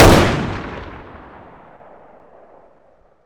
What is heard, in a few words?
ks23 distance fire; weapons